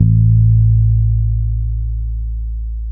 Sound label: bass, A 2; Spectrasonics; Bass Legends CD 1